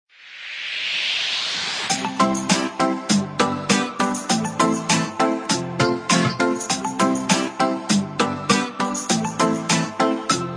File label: Summer Reggae; pixelworlds